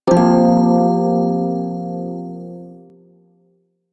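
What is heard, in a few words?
Prime Shawzin Pentatonic Minor Chord A; Music; Warframe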